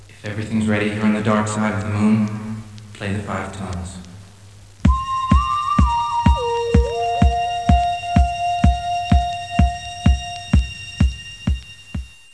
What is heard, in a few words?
5 TONES